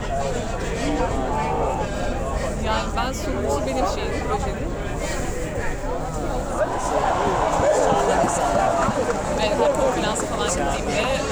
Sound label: foley